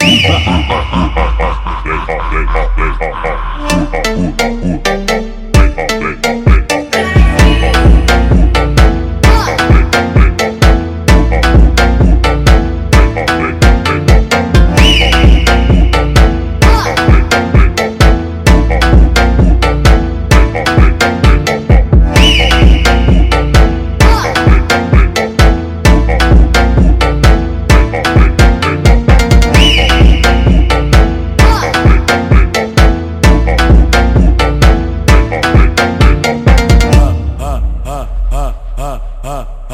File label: FUNK BRAZILIAN FUNK